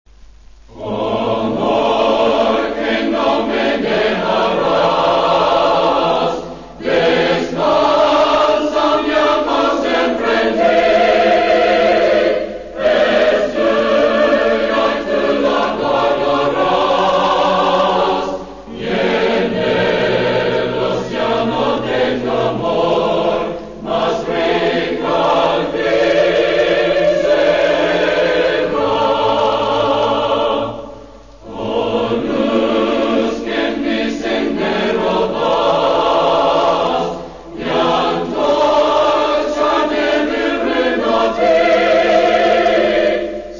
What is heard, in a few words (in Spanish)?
himno